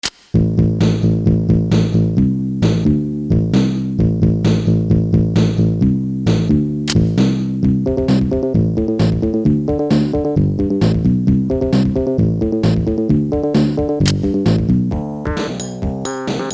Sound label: casio sa1 supercomp